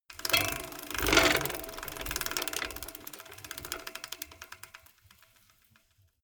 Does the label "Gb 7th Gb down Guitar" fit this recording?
No